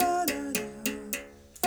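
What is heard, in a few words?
86 high berimbau phrase; Roland LCDP11 Africa VOL-1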